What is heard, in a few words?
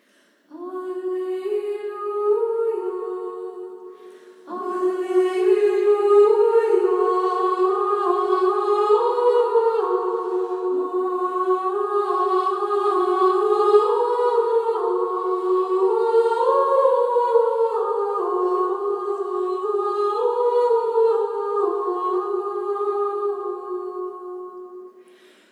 Alleluia Benedictus